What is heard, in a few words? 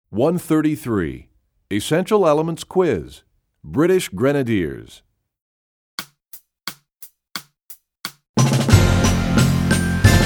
Percussion; EE Quiz; EE2000 Book2